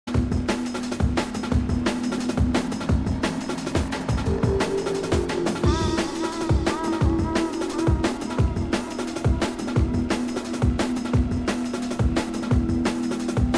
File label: Drum and Bass Jungle and Old Skool, UK Bass Radio